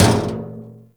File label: Physics Barrel Impact Medium, Metal Barrel; Warframe; Physics Objects